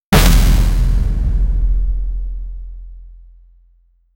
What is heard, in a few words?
a long futuristic cinemat